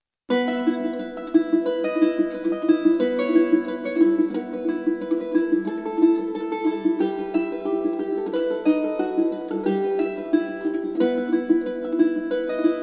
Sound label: Exhalation